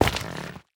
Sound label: step, netherrack; block; MagicRPG